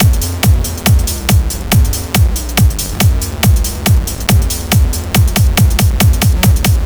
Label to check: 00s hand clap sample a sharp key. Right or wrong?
Wrong